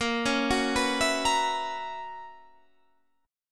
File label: B Major 7th Suspended 2nd Suspended 4th Bb, chord shape